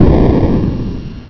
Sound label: Nuke; Assassin